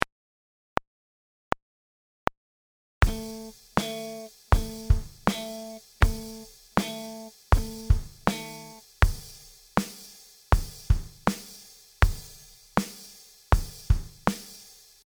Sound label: groove